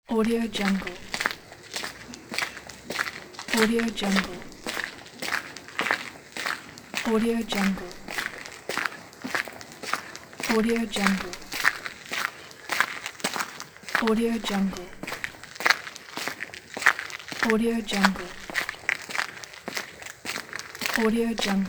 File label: footsteps running on asphalt